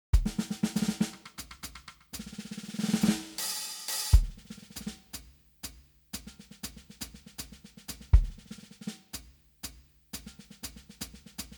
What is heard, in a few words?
Drum Solo